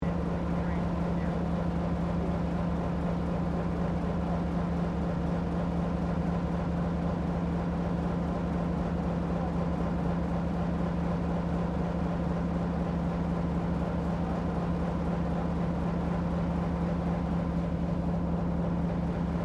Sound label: Train Diesel TGR X Class 1951 Tasmanian Idle Platform Loop; Bellarine Tourist railway vehicles; Trains